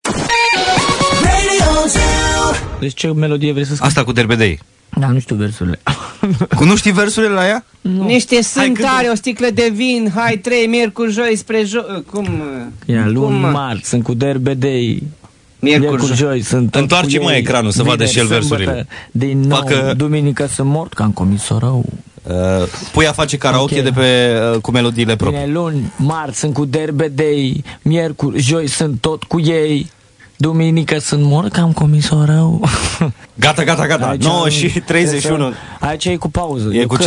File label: Karaoke; Morning Zu